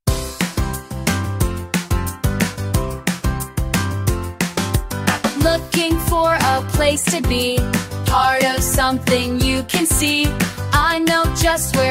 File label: song